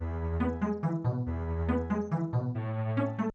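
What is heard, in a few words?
pizzicato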